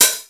nice hat sound B key, closed high hat sound tuned to the B note; Royality free closed high hat sound tuned to the B note; Tuned drums